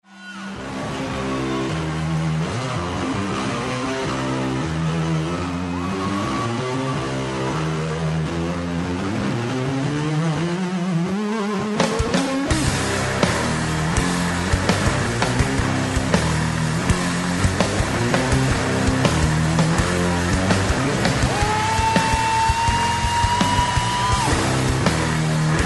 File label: Riff